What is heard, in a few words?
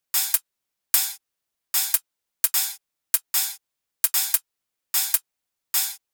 Dubstep Drum Loop Cymbals, 150bpm; Stem Seperated